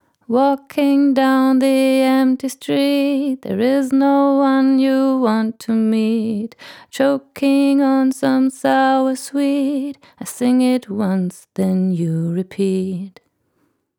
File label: Female Vocal; Tegeler Audio Vocal Leveler 500